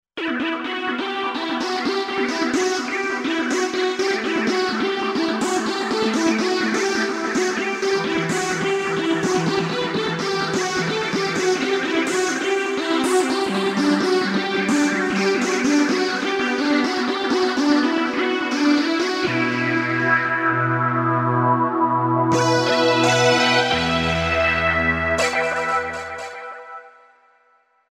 Any club, Roland V-Synth

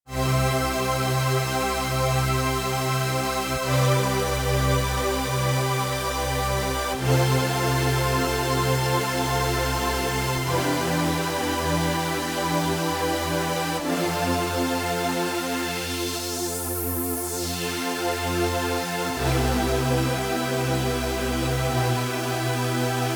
Huge Strings, Korg Radias-R

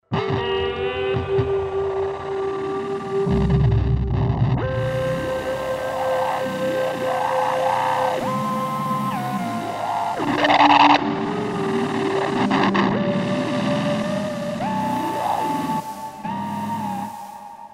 Bristol, Roland SR-JV80-12 Hip Hop Collection

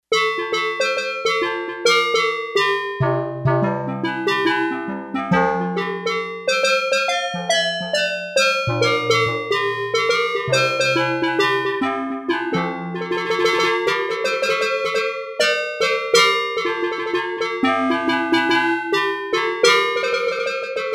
Steel Cans, Yamaha TX802; Factory Patches; Voices

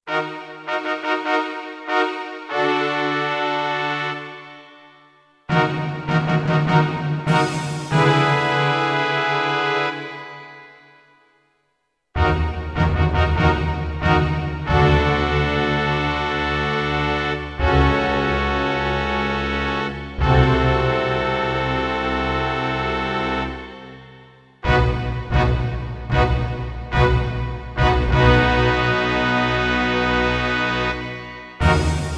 Touch Orchestra, Kurzweil K2000; Kurzweil K2000 V3

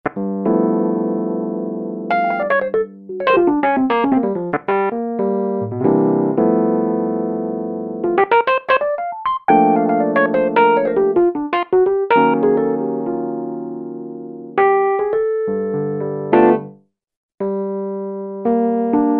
Suitcase EP; Roland SRX-07 Ultimate Keys

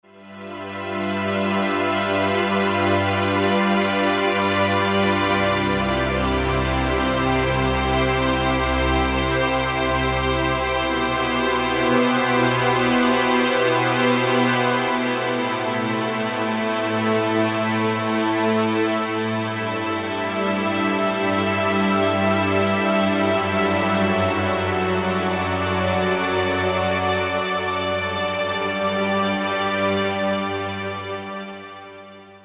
ens Xtra Slow; Factory Presets

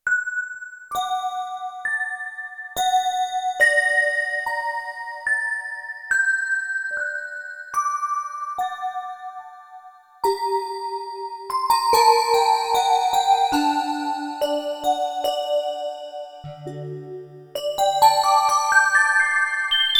Chorus Bell, Roland D-70; Factory Performances

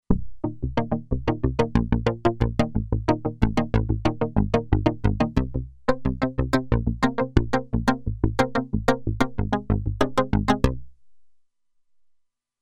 Arp Clav, Roland Alpha Juno-2; Factory 2

